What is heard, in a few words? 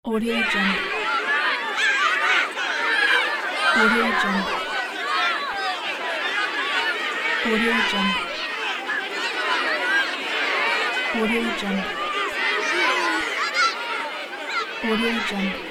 shouts of the crowd of people